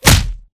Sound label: bullet light ballistic flesh; Titanfall 2